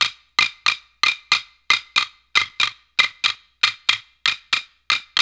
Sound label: World Dance; OZ!; Abor